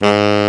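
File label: TENOR HARD G#, TENOR SAX; Northstar - New Gold VOL-1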